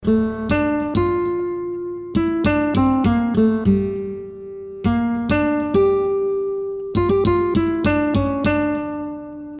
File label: simple guitar message